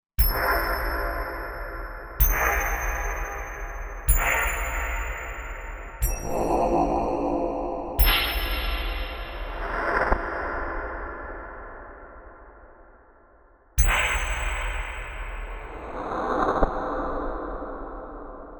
Hit

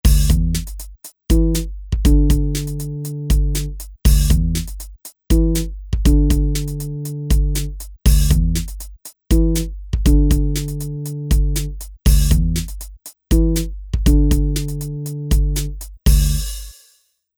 Rap Hip Hop

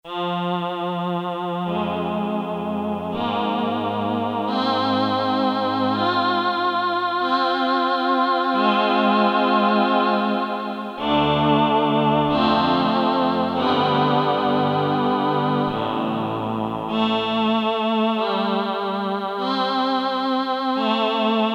Choir; Korg DSS-1 Sound Library

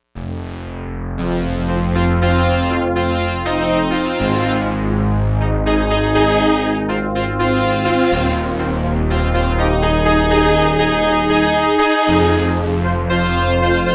Dynomoog; Digital Synthesizer

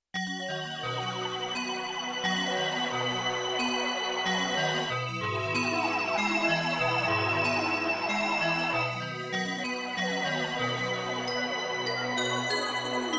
Watersp Bells Split; Spacey Keys

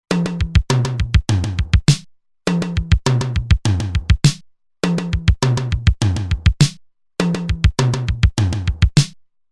Fill In 4-4, Roland TR-505; Factory Rhythms

